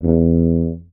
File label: Contrabass Tuba Straight E1; DirectWave; Varazdin Orchestral